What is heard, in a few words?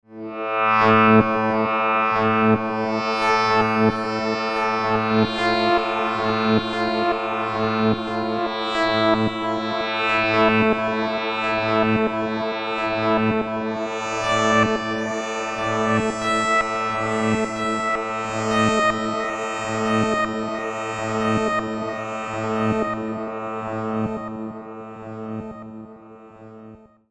MD900 Dub Chord